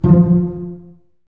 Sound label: BSS F 3 Pizz, String Ensembles Basses; Miroslav Vitous